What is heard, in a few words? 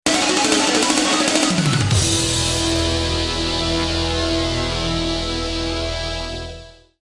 Raising The Roof Alert